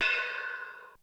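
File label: Hi Hat Open, Korg DSS-1 Drums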